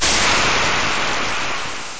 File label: Meat Sizzle Sound